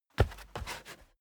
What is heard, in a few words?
step barefoot wood plank scuff; Footsteps; schritte